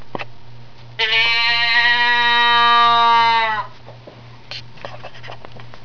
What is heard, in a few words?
mooooooo, mellotron